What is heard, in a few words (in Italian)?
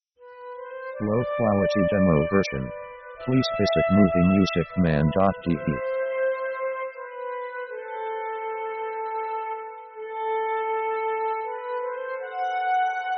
la vita sognata di pace